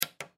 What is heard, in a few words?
Ship Radar System Flip Switch; Transportation Watercraft; Boat; Boat Sound Effects; Blastwave